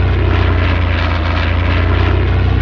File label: air; Mafie 1